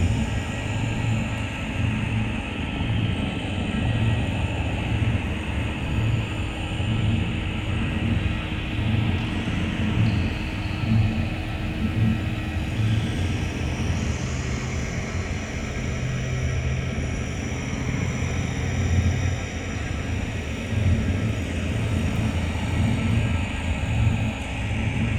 ship shroud seal idle; Stellaris

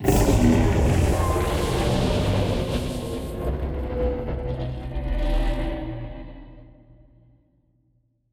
voidworms mature death; Stellaris; grand archive; ships

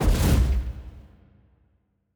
kaiju superweapon fire burst; Stellaris; biogenesis; ships

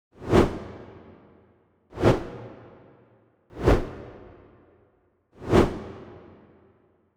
kaiju juvenile section move; Stellaris; biogenesis; ships

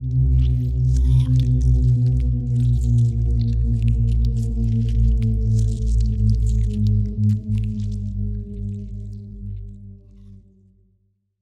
tilt close; Stellaris; biogenesis; ships

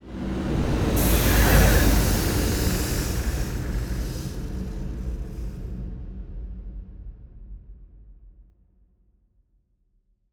ship juggernaut move; Stellaris; toxoids; ships

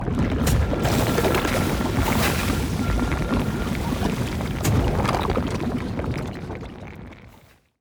fungal spread; rifts; Stellaris; astral planes

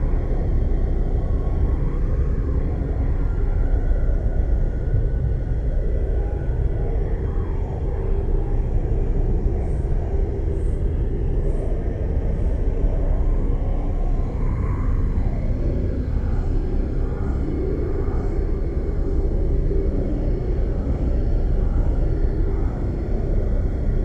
ship enclave idle; Stellaris; overlord; Starbases

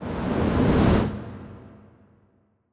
kaiju juvenile tail swish long; Stellaris; biogenesis; ships

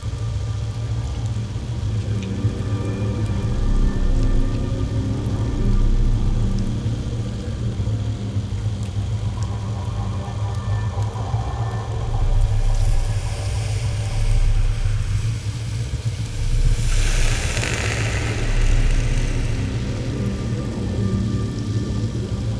ship corvette idle; Stellaris; toxoids; ships

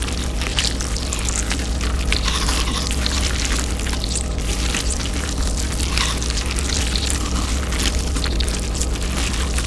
ant ship stinger idle; Stellaris; biogenesis

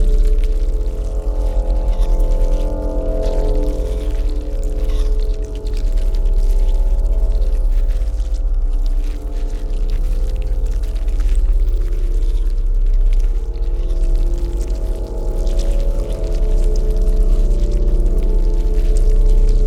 ant ship science station idle; Stellaris; biogenesis

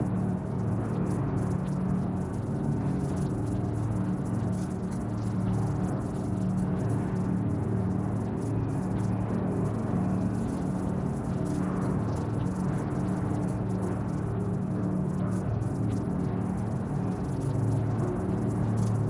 neuron calculator phase 1 idle; Stellaris; machineage; superstructures

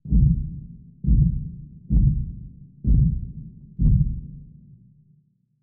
muffled kicking; rifts; Stellaris; astral planes

